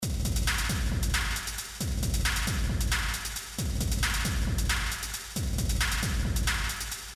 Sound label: with reverb; sample it loop it